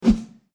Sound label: heavy swing